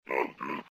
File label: orangutan scream